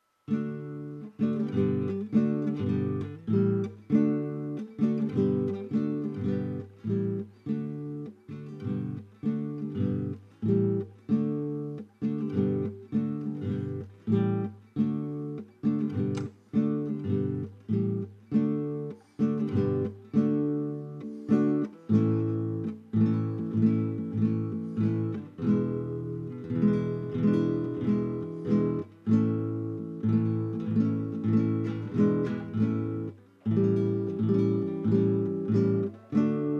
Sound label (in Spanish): Seguidillas Lanzarote Guitarra